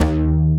NOIZE BASS; Dance; BASS SOUNDS; Masterbits; Dance Trax

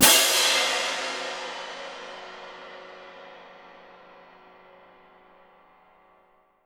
CYM F, Percussion; Miroslav Vitous - Percussion & Harp

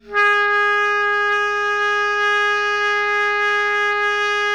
BARI PP G#3, Sax; Giga Samples Collection; BARITONE PP

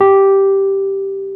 WURLY G3; E-MU Formula 4000 Series Vol. 3; Analog Odyssey

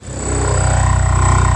AIRHAMMER, MACHINES; Rarefaction; Poke in The Ear With A Sharp Stick

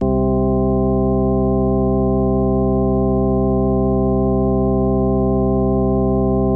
ORG C, ORGAN B3 HAM; Best Service ProSamples vol.55 - Retro Sampler; AKAI

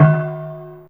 Stl Bell C2, Fairlight CMI IIe, Mallets

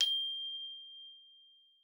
G5, GLOCKENSP S; Xsample VOL-4

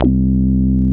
BAS, Eurotech; Sounds Good

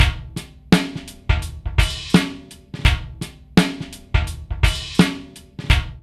LOOP

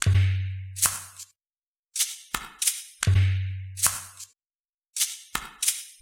Emery Percussion, Acoustic Beats; Sonic Foundry (Sony Creative Software); Myths of Technology

